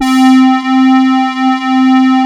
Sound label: P800 SWL C3, Korg POLY800; Back In Time Records; Korg Universe VOL-1